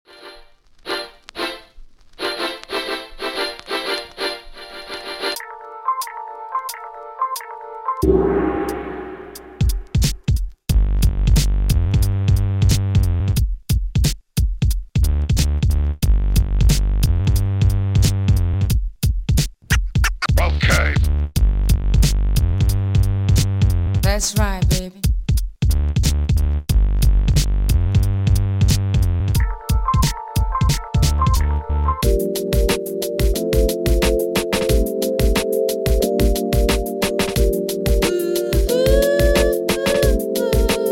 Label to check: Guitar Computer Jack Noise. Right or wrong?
Wrong